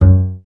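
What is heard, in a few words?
string contrabass; Miroslav Vitous; Solo Instruments VOL-2; Solo lnst. II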